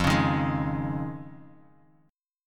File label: F Minor 7th Sharp 5th Guitar Open D; 3,4,1,2,x,x; down; chord shape